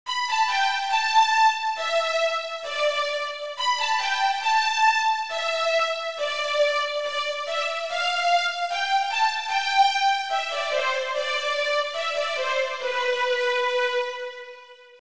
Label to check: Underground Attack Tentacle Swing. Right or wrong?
Wrong